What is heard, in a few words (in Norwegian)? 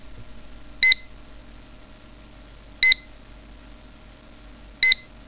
klokke; de battlerat